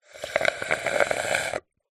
drink slurp soda dregs ice through straw fast food; human; zapsplat